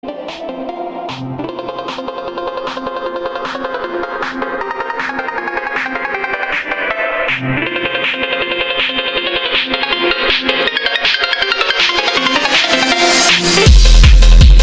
phonk

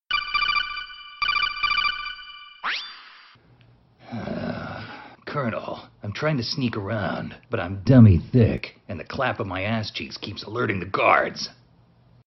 bass boost

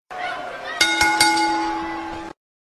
bell ring; wwe

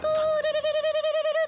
phone ring; doppio

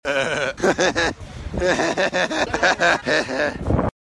hehehe; ice poseidon

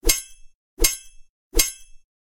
sword clash

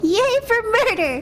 yay

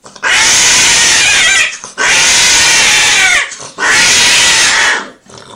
cat

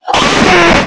horror scream

